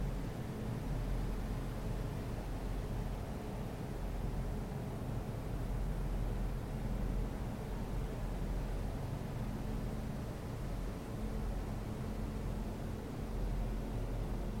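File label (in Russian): длительный непрекращающийся звук работы производства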